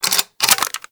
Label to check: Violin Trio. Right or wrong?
Wrong